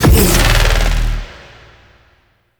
Boss Teleport End; Warframe; Cephalon Weave

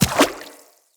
Infested Medium Run Water, Footsteps

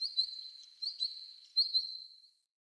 Cave Bird; Caves; Glades Of Andurin

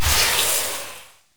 Shotgun Hit Fx Stinger; Pax Duviricus

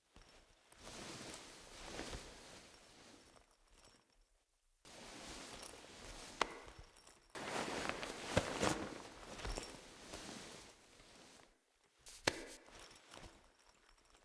Foley; End Mother; Cinematics; Warsongs; Warsong Prologue

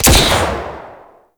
Shot, Weapons; Warframe; Tenno; Wraith Sidearm; Epitaph Prime

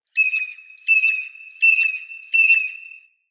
Mountain Forest Predatory Bird Far

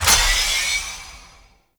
Lantern Power Up; Warframe; Emissary Season Two

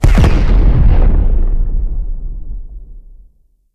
Enemy Ship Destroyed Explosion; Vehicles; RailJack

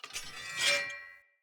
Cleaver Heavy Whoosh Wind Up Accent, Swords; Weapons; Player; Bromius Cleaver

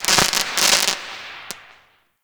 Firework Crackle; Warframe; Fireworks

